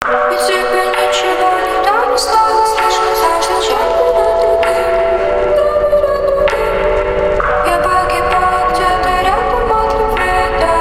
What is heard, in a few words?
ZIMA ATMOSPHERE REMIX